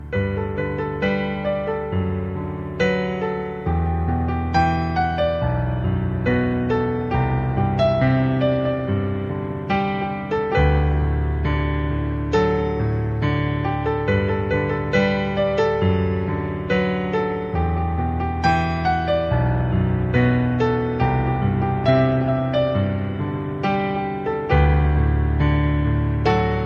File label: Piano